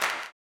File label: CLAP, blues & soul; ILIO; Vocal Planet